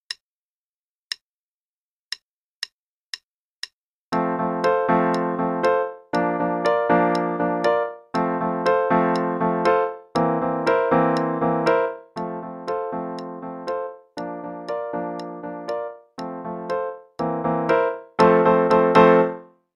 Latin Sounds, qn=120; Level 2B - 2nd Ed

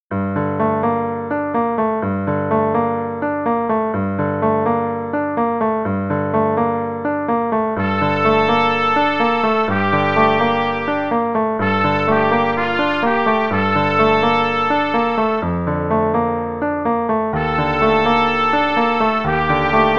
no click, qn=126, trumpet; The Really Easy Trumpet Book

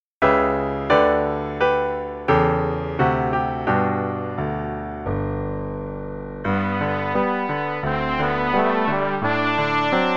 Play-a-long, in G, both parts, qn=88; Iliad; Smith, Leonard B.; trumpet

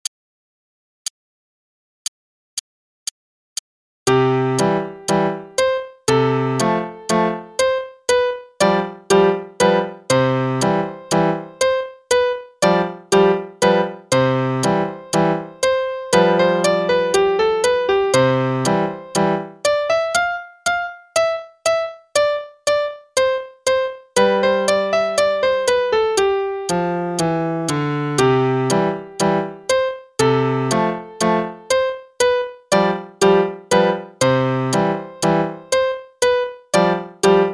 Trumpet Concerto (qn=120); Faber; Adult All-in-One Book 1

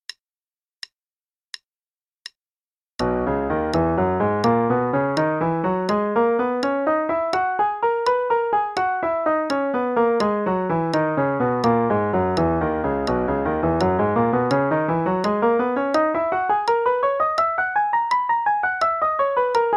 B Major, triplets then 16ths (qn=84); CM Level 6

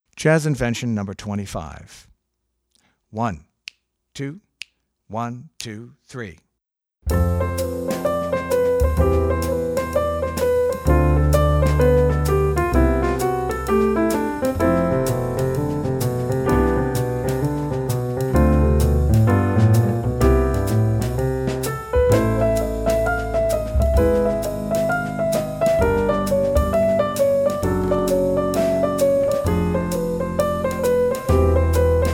Jazz Invention No. 2

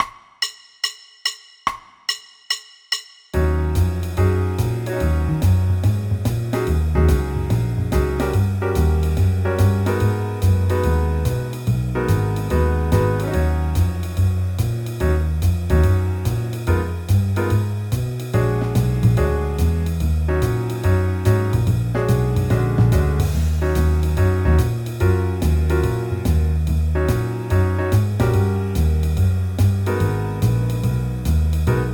Play-along Blues in Fmin qn=144, Jazz Piano; 5 choruses

